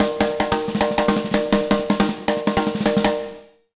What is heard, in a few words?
BEAT, Jazz Latin Drumloops; USB Soundscan vol.08; AKAI